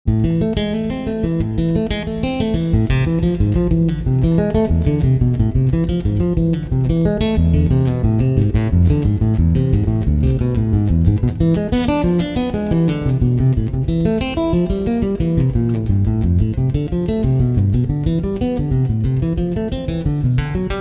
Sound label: Prelude in E Minor